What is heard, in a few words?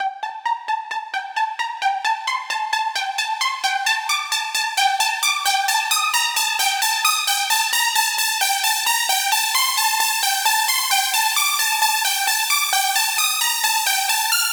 ARP G 132; modern techno; teknovault modern techno production suite